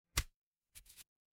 paper fabric page turn

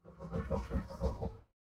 magic scifi teleport

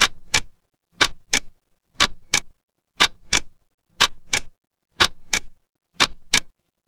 mechanical clock tick

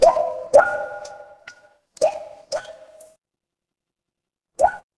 water drip

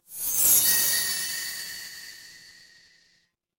magic scifi spell

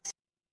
ui beep

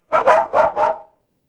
animal dog bark

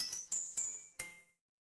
ui chime

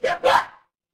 feedback level up

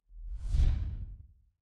transition sweep

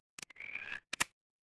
cartoon stretch

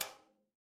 impact metal hit

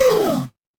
feedback fail

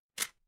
office camera

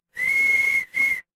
instrument whistle

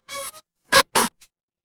cartoon squeak

